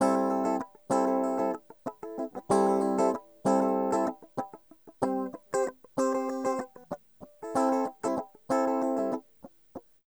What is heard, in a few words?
Hip Hop and R&B; Best Service ProSamples vol.02; AKAI